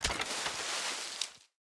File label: tree leaves; factorio 2.0.55